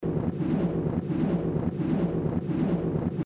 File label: shield powerup active